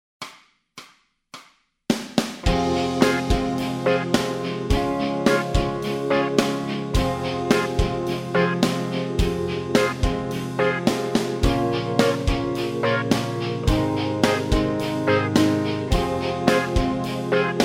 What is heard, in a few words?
bass scales, backing tracks